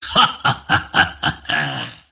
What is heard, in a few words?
dismember laugh; pudge